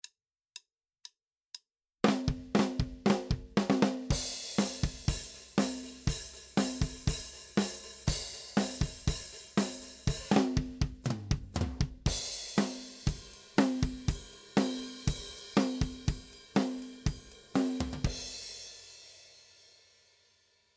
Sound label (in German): Drumbeat mit Mikro unbearbeitet